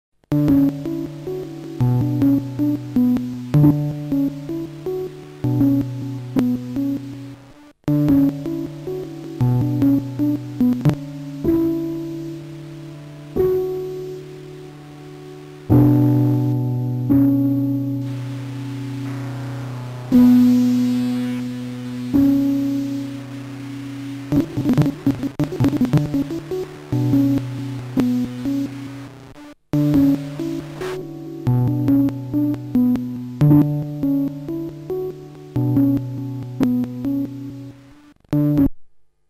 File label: Piano Sample; Bastl Microgranny 2; Monolith